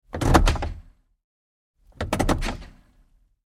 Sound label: Truck GMC Savana Gearshifts Snap; 2010 GMC Savana; Trucks; Vehicles; Big Room Sound